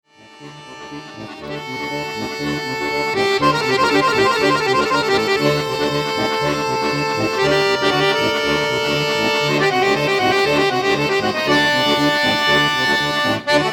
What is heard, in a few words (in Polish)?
Akordeony TRIO